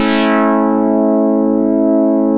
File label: BOLERO DR, Synth and Organ; InVision Interactive; Keith Emerson